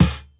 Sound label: Kick, jungle breaks drum sounds